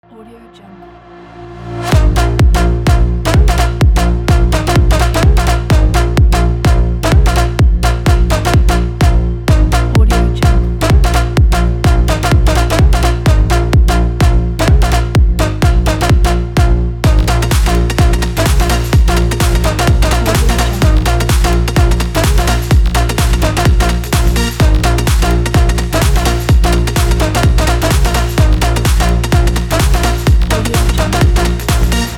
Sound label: dance intro